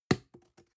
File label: plastic can small fall bounce medium; objekte; dose